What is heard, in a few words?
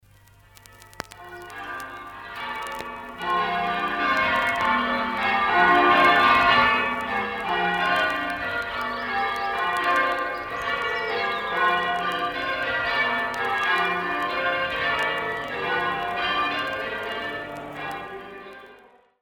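Great Chesterford Church Bells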